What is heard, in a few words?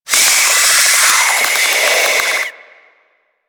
Infested Face Hugger Charge; Warframe